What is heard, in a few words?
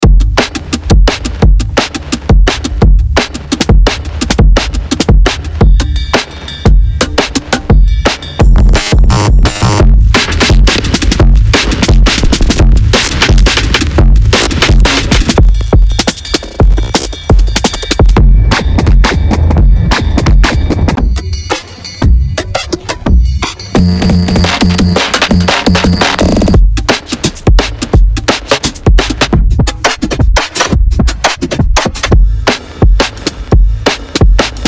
Raw Acoustic D&B IDM Series Kit